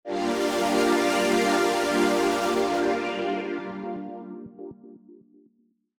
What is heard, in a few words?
Tech Synth 120 bpm, Synths; musicradar